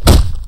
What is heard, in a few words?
kick